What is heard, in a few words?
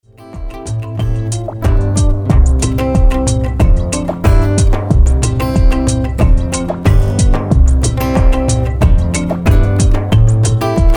Island Splash